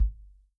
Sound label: FUNK KIT, REAL DRUMS; Best Service ProSamples vol.43 - Real Drum Kits